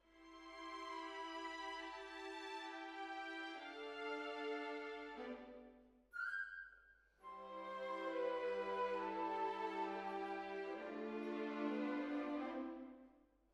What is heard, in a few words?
Hall